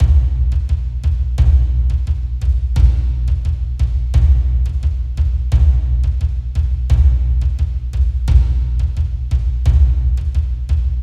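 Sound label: Deep Drum Loop Am 87Bpm; Construction Kits; STEMS; Ghosthack; SkyRealm; Kit 18 - Shivering Sea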